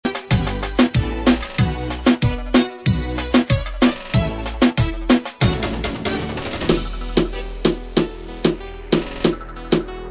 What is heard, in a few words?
Reggaeton Beat